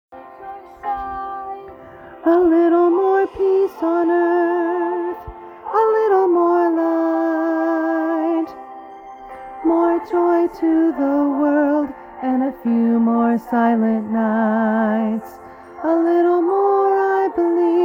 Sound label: harmony chorus